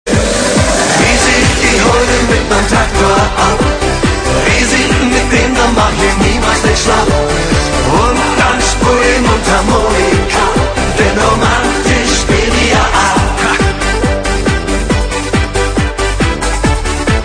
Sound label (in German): Disco